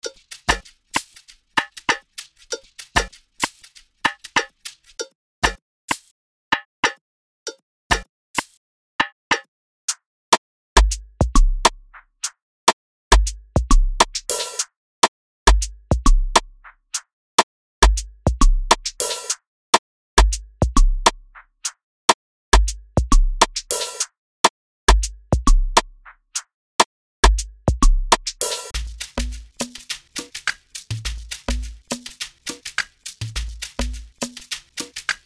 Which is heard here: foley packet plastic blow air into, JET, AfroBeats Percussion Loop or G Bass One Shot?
AfroBeats Percussion Loop